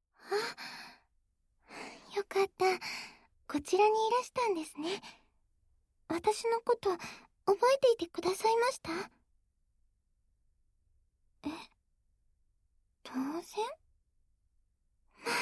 両耳舐め; 婚活本編; 茉莉と一紗の取り合い両耳舐めアピール

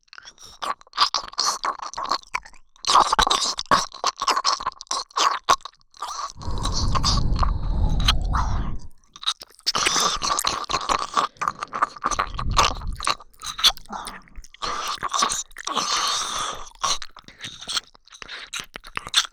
二人で耳舐め; 二人に犯され

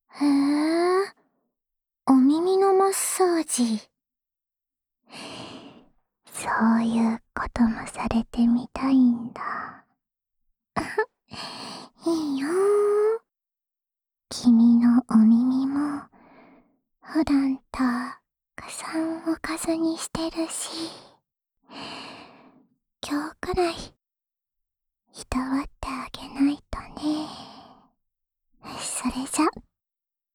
安眠用お耳マッサージループ（両耳）, ASMR; 安眠用お耳マッサージループ（両耳）

